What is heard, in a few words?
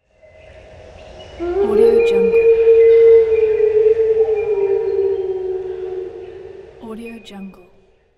jungle horn